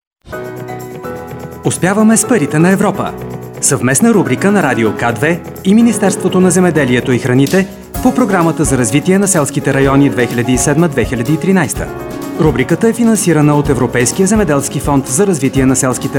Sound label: VIOLIN